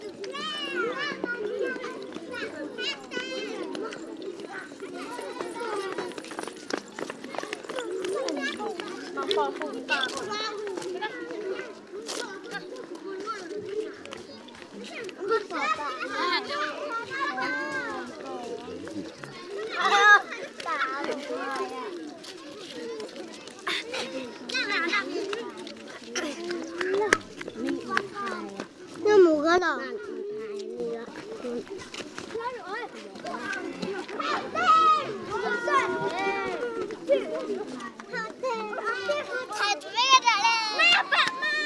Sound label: kids playing